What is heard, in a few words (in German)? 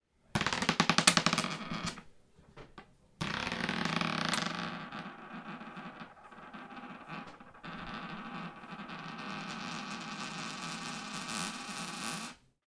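Münze; zoom h4n pro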